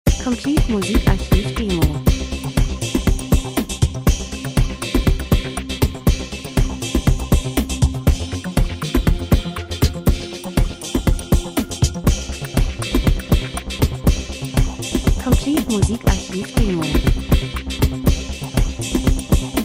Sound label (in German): drums, rhythmus; Sequenz Elektro Rhythmus Spannung monoton Maschine Produktion Nachdenklich Dokumentation Industrie